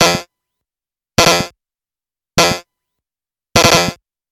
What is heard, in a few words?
prong, alarm